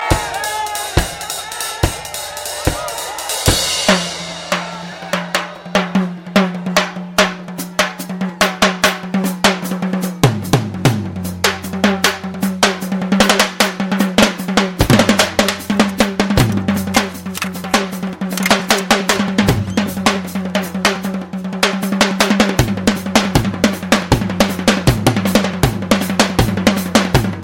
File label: Drum Shots